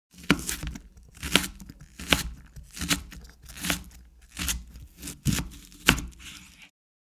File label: Chopping onions wooden board kitchen